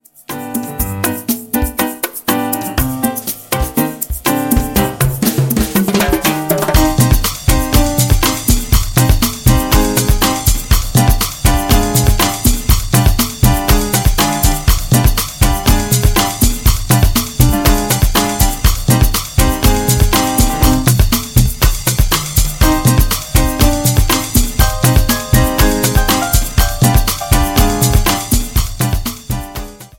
Latin dance, ringtone; MIUI12

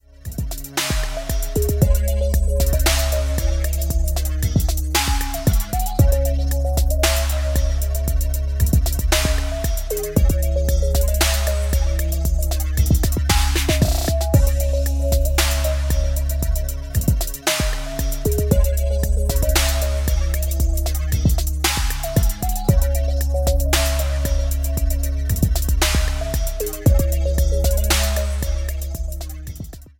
Infinite trap; MIUI12

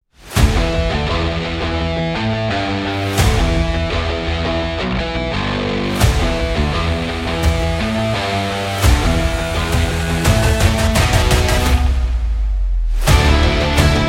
Rock Trailer; MIUI14 and HyperOS

